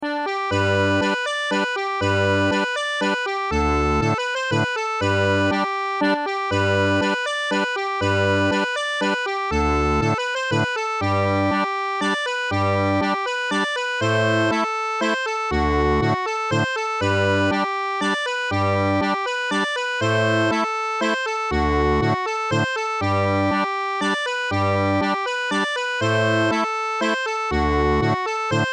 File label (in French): Mazurka; de Lapleau